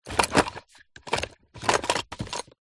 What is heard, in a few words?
leisure toys wooden and plastic movement rummage inside kids bag